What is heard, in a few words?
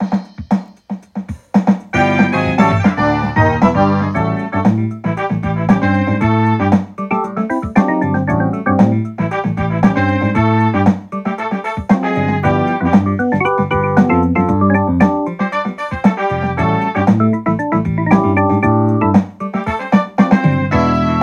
Demo PSR-170 Yamaha